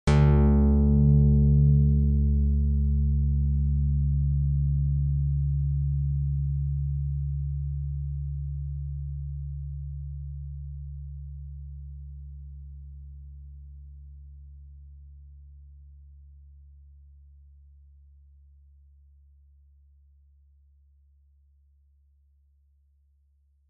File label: lyre